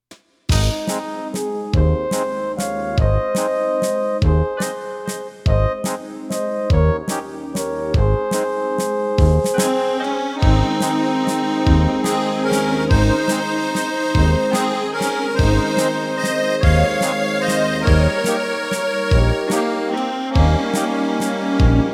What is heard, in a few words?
Waltz of Romania